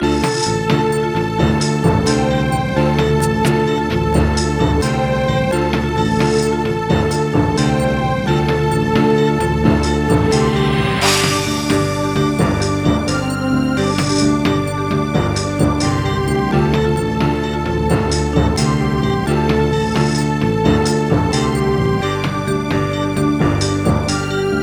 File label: Alesis Harmony 32; Heretic